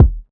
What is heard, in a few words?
clean kick drum one shot a key